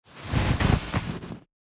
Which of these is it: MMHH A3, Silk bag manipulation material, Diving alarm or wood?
Silk bag manipulation material